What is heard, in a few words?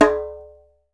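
LARGE CERAMIC, DUNBEK; Voice Crystal - Steve Reid Definitive Percussion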